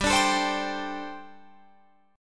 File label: G Suspended 2nd Suspended 4th G, Guitar, Open F; Gsus2sus4 strummed; 19,21,21,19,22,19